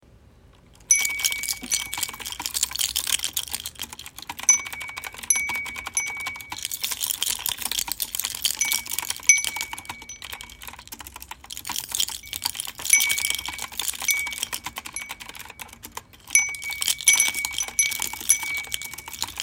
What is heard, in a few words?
3 Objects Ensemble